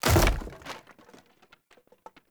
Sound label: Barrel Wood Hollow Medium Destroy; Objects